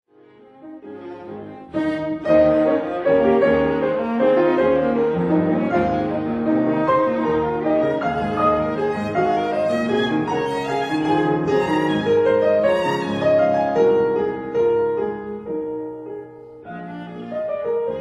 Sonata for Viola and Piano in F minor Op 120 No 1 III Allegretto grazioso; Brahms